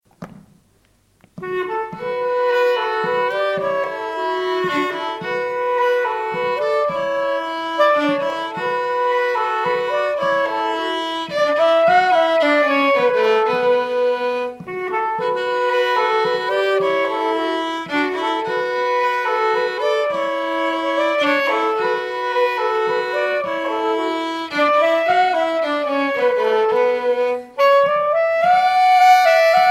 stämma till Irländska valsen

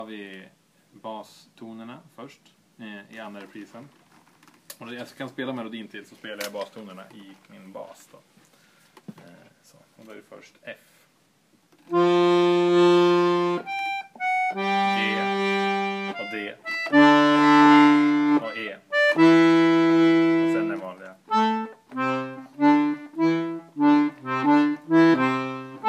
Vispolska från Forsa, basgång

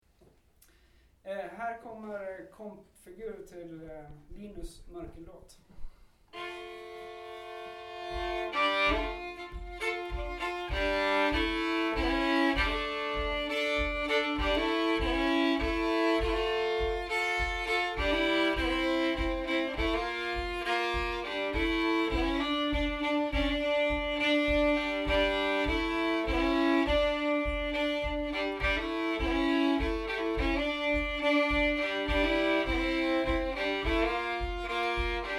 Komp

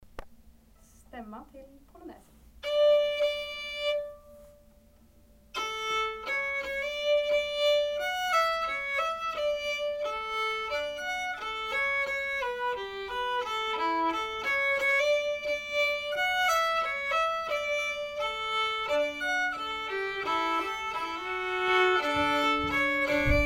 Stämma pollonäs från Norrbotten

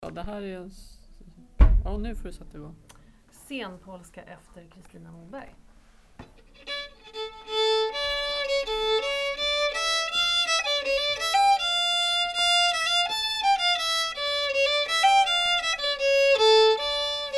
Senpolska; efter Kristina Moberg

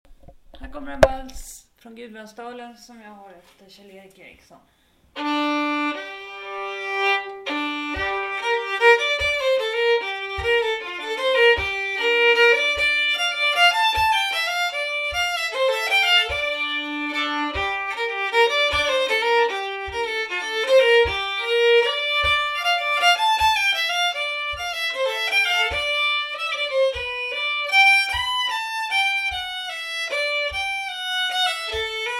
vals gudbrandsdalen

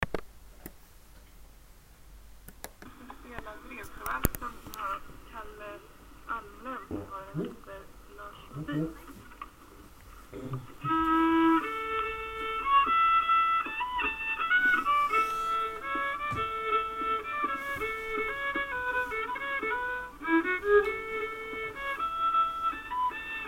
Drevsjövalsen

